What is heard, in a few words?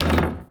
Street Ball Glass Back; SFX Kit